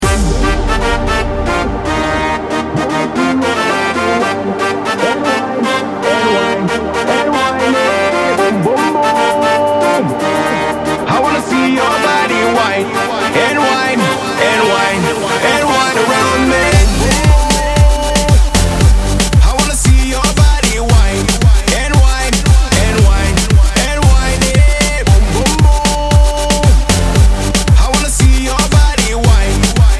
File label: moombahton remix